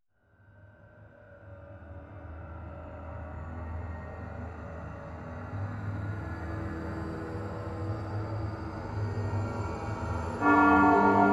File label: cymbal; Klandiorgue